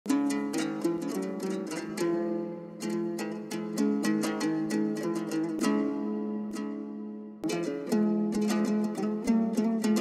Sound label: komuz remiks kyrgyz komuz remix